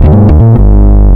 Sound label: OTHER BASS; Big Beat Samples & More